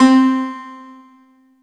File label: Kawai K5000W Dulcimer C4